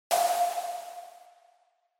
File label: Single Accent Low